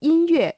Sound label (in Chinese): 音乐